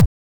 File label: Kick, techno drum